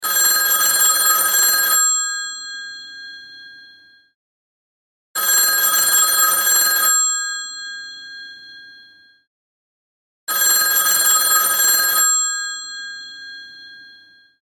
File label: rotary phone ring